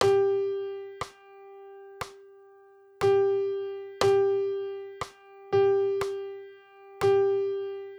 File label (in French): figures pointees binaire